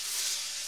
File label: hash, Percussion Swish